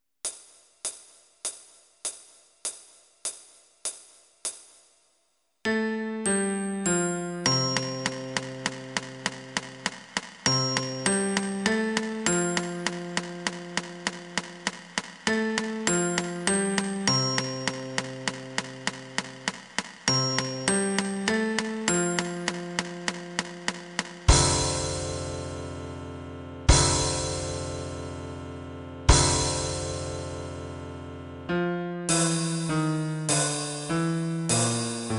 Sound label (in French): big ben beat